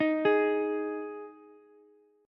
G sharp 5th G sharp Ukulele; arpeggiated; JGuitar

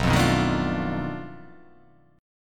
B 7th Sharp 9th Flat 5th, down

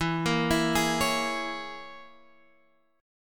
E 6th Flat 5th E down; E6b5; arpeggiated

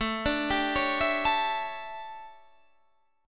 D Major 7th Suspended 2nd Suspended 4th, chord

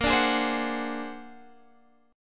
Bb Augmented 9th Bb

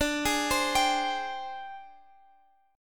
Ab Major 7th Flat 5th D

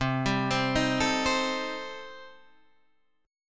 A Minor 7th Flat 5th C, chord

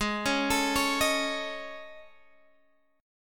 A Major 7th Flat 5th

